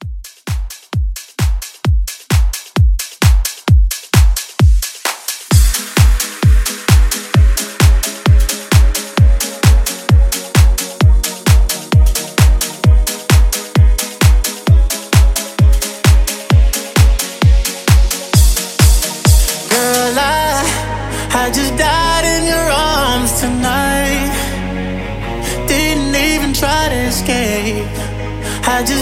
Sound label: Future House Rework